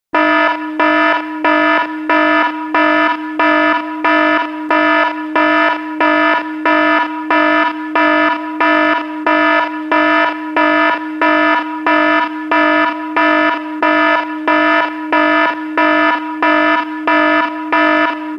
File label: alarm loud pulsating